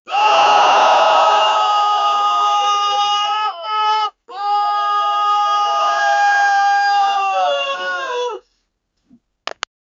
scary cries soldier

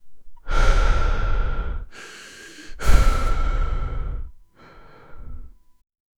create the sound of a, accident

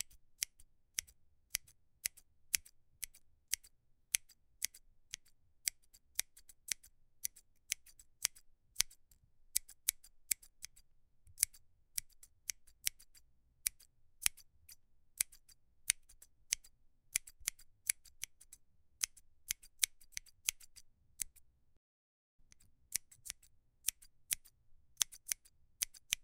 soft professional hair cu, hair

